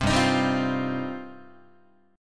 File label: G 7th Suspended 4th Sharp 5th, Guitar